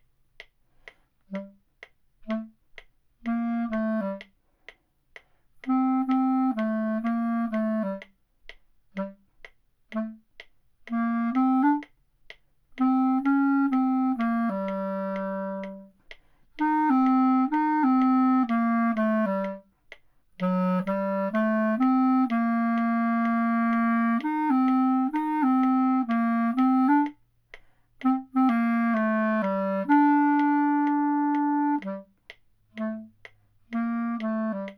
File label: Metronome, Clarinet; Clarinet Student Resources